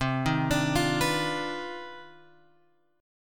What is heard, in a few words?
C Minor Major 11th C; {8 6 X 7 6 7} arpeggiated